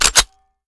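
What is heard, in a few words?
submachine gun raise first charge; gmod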